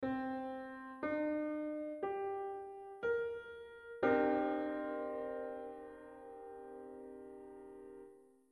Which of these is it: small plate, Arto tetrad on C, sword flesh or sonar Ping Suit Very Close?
Arto tetrad on C